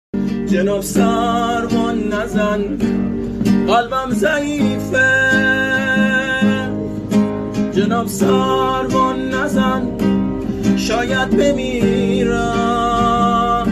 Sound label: Guitar